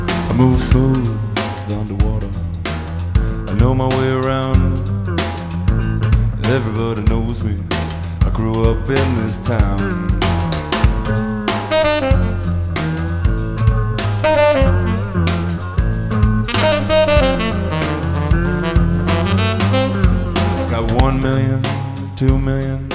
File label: rock